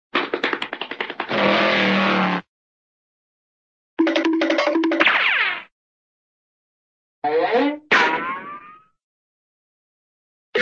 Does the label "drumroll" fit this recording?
No